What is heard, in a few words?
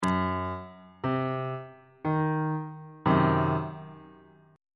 d double flat diminished 2nd inversion; bass clef